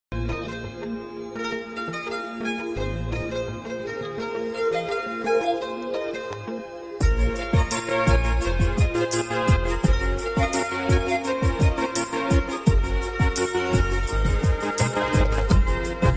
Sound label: hindi bgm; ghajini; aamir khan